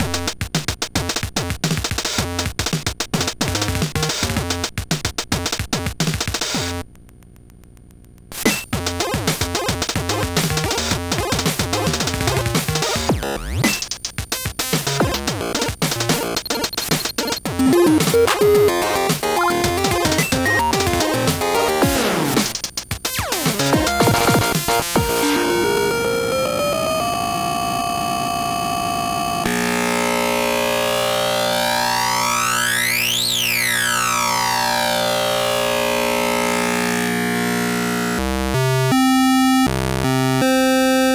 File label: sound test gbc